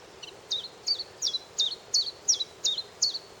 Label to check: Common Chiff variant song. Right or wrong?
Right